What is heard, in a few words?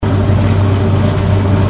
crusher